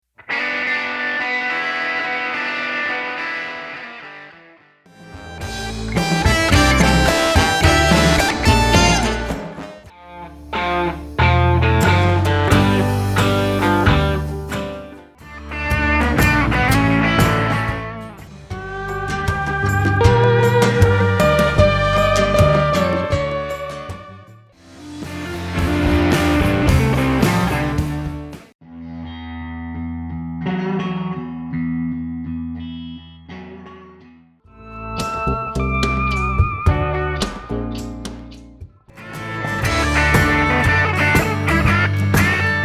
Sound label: guitar solos; guitar mash-up